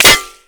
impact metal; Modern Warfare

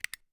item jammer switch; Escape from Tarkov

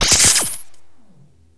SDI Laser; Civ4 warlords

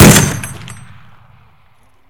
Vehicle Weapons; Red Orchestra Ostfront 41-45

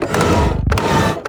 biomech look around

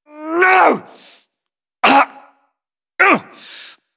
chase revolver; Modern Warfare

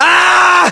melee attack

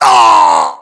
death, english

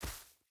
footstep barefoot grass jump land; Rust

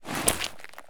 prone concrete; Escape from Tarkov

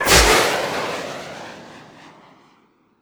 turret aa launch

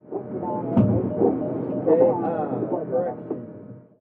ambient battle; World Of Warships

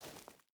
footstep burlap gravel walk; Rust

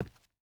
footstep boots concrete walk; Rust

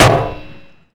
button punch

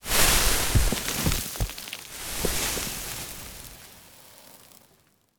combat atmosphere; World of Tanks

